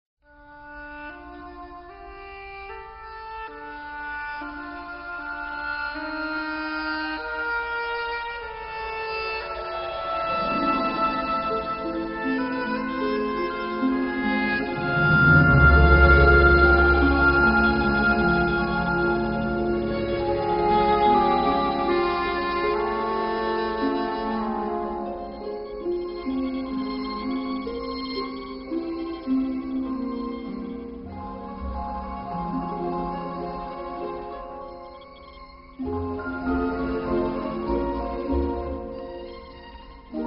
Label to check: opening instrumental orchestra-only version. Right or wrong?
Right